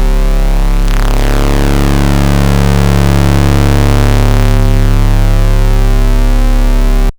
Pulse A0, Synths; Multisamples; Sh Pulse; Ableton Live 12 Lite; Core Library

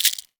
Shaker Rattle; Drums

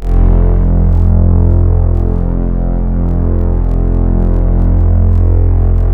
Strings; Ableton Live 12 Lite; Vector; Sprites

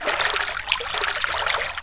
water; Game Maker5

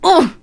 hurt; Genesis3D

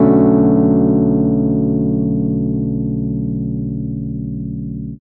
E-Piano Blue Bmin9; Piano & Keys

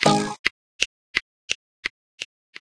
buzzer on; There; ThereClient

